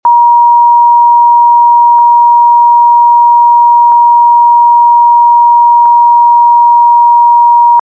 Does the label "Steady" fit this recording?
Yes